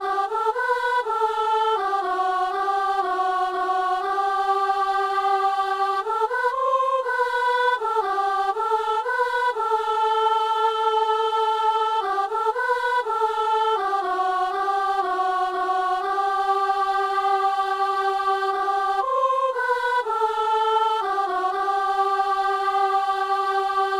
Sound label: soprano; Père saint; Messe du 17 janvier à Saint-Denis